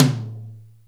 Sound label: TOM MID, DRUMS TOMS